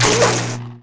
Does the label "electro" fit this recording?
Yes